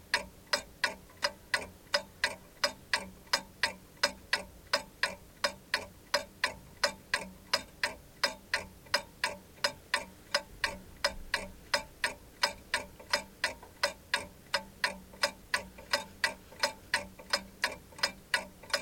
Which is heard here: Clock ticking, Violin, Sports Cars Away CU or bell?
Clock ticking